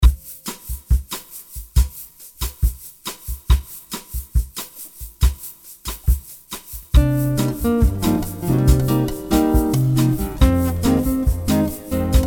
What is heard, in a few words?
Light Bossa nova